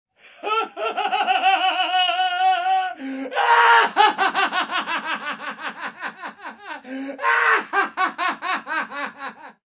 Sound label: HUMAN LAUGH